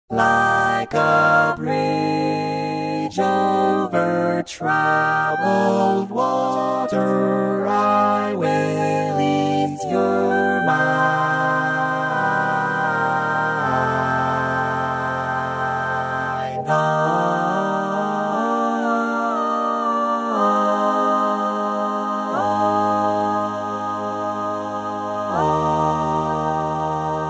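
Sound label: Baritone, barbershop tags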